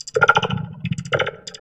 VOX Electro Vox; Sound & Vision; Gigapack II CD 2 (Roland)

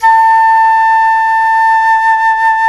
Jazz Flute

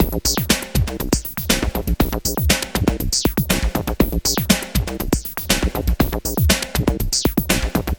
Synthetix, Processed Percussion

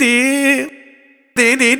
Vocals Male World Pop

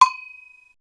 Perc; Coldcut's Kleptomania VOL1

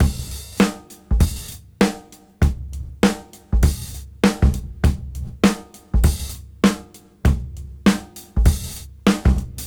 Swing 98 BPM; AMG; Keith LeBlanc Phat Butt Jams

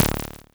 Cwejman S1; DevSnd Cwejman S1 - RD Samples

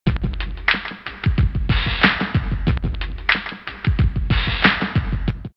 Cave Dub 92, drumloops OldSchool; X-Static Goldmine

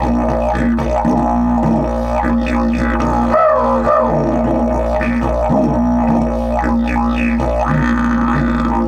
108, Didgeridoo

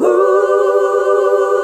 "HUH" G, FEMALES; Best Service; Hyper Dance

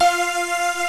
MULTI SYNTH

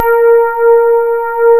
soft rhodes A#3; East West; Global Sounds; D50

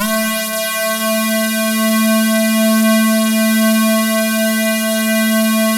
SAW, Synth and Organ

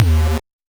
Noise; musicradar; 8-bit bonanza

